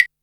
DR-660 Perc, Drums, Boss DR-660

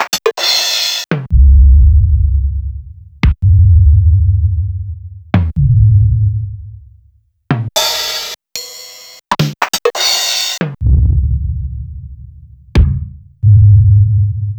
Brootle RZ1; M8; Sliced

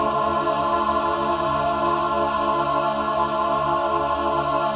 min chord, Symphony of Voices

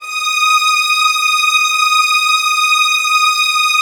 D#3, Strings; ILIO; Kirk Hunter Virtuoso Strings VOL-3; STR TAME

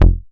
Bass, 2600 F1, Synths, ARP 2600

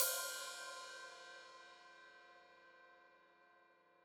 Hat Open, Hi-Hat

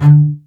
BASS MART D3; AKAI S-Series CD-ROM Sound Library VOL-2

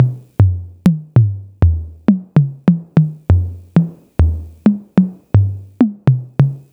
808 Toms

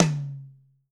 TOM 1L L, DRUMKIT

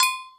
FX Sounds, Drum Machines

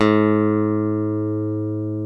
ELECT. BASSES; OMI - Universe of Sounds

